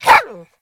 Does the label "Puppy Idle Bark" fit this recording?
Yes